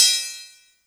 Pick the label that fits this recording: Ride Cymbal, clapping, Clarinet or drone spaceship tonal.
Ride Cymbal